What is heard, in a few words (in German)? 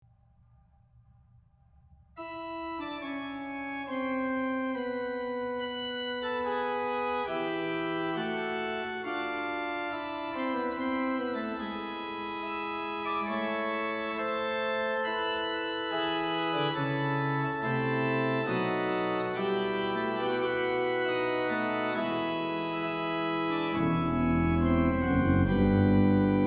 Choral; Hanff; Erbarm dich mein O Herre Gott